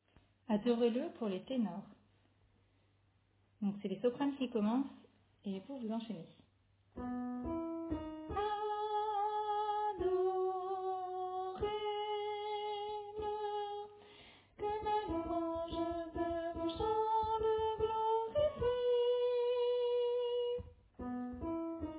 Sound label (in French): Tenor